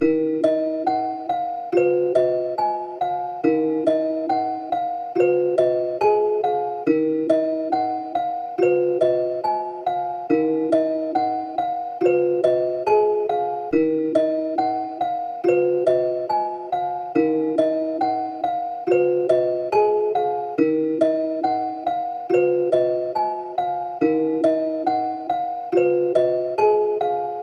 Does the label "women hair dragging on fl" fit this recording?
No